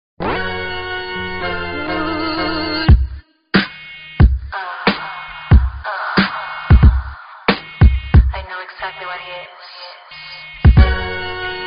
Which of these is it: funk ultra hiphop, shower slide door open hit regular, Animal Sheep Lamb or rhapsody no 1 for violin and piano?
funk ultra hiphop